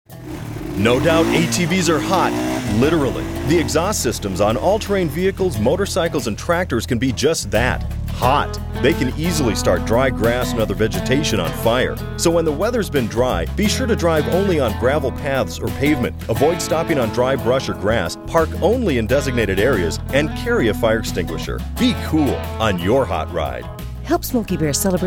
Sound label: atv muffler fire; wildfire prevention